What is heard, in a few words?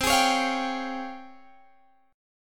C sharp Major Sharp 11th C